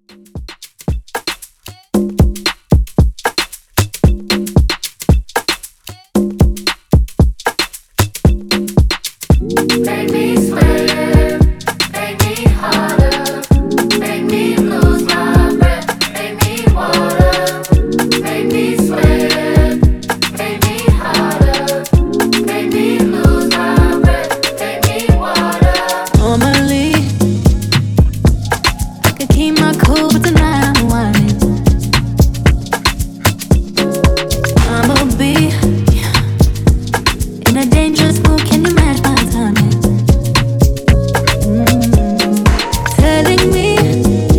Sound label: afro Redrum 114Bpm